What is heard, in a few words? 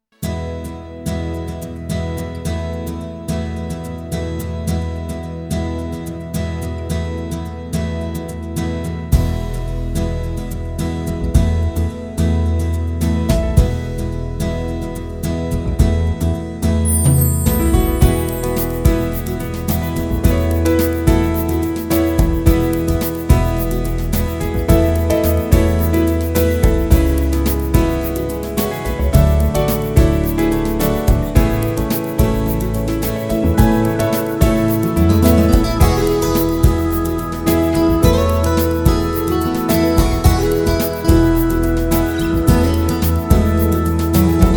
unplugged